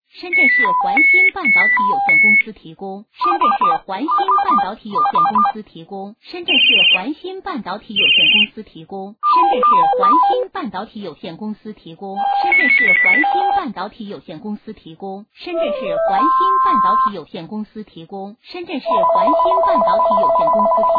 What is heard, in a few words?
12 Tone Ring, Telephone Ringtone; LingYin